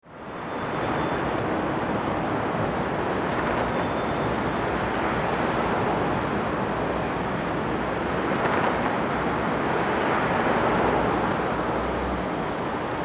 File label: Traffic City